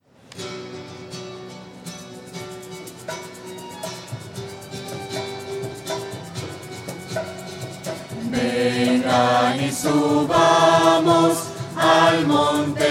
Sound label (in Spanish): vengan y subamos voces; misas 2012